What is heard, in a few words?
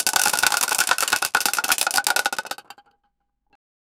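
a wooden silver crate shaking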